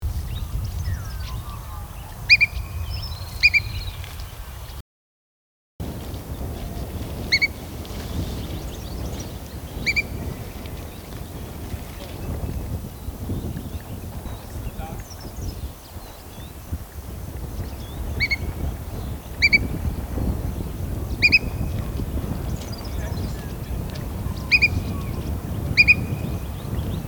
Hieraaetus Morphnoides; Little Eagle; Call

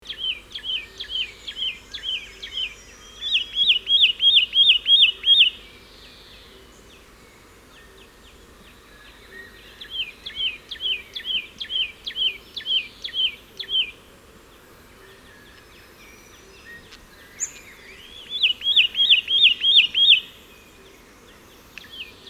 Myiagra Cyanoleuca; Satin Flycatcher; Call 2

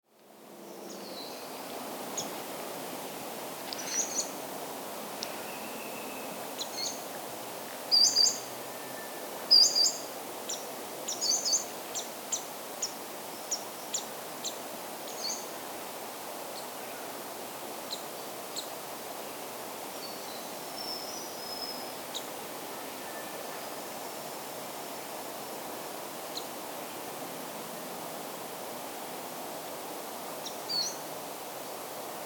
Daphoenositta Chrysoptera, bird species; Varied Sittella; Neosittidae (Sittellas)

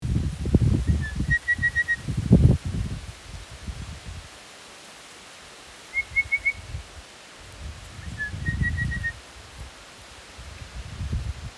Pardalotus Rubricatus, bird species; Red-Browed Pardalote

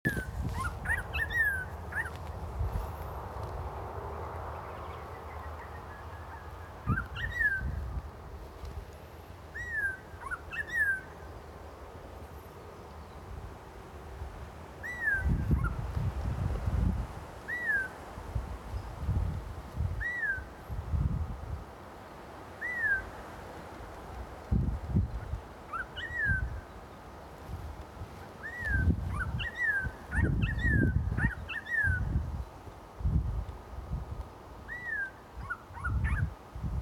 Pomatostomus Temporalis; Grey-Crowned Babbler; Call

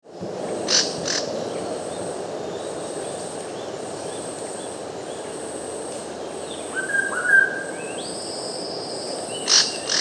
Symposiachrus Trivirgatus; Spectacled Monarch; Call

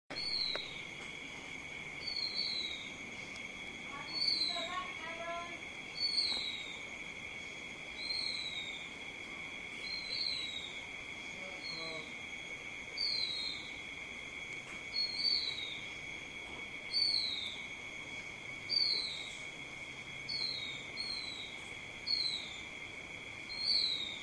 Zosterops Lateralis Vegetus; Silvereye; Family - Zosteropidae (White-Eyes); Call

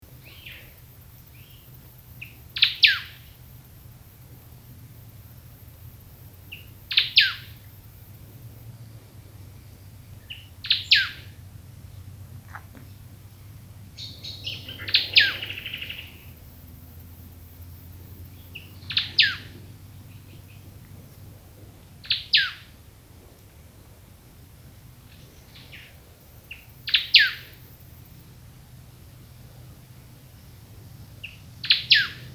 Poecilodryas Superciliosa; White-Browed Robin; Call; Call 2

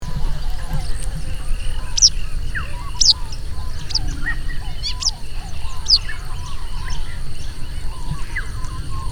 Motacilla Tschutschensis; Eastern Yellow Wagtail

